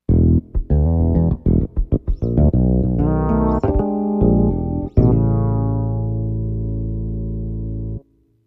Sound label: Tyros Mega Fretless